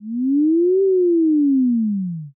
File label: whale